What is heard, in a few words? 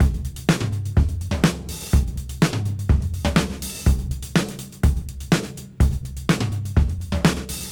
Disk 125 BPM; AMG - Keith LeBlanc Phat Butt Jams